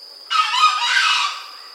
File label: gallus varius